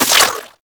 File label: Ink Rifle Reverse Start, Weapons; Warframe; Tenno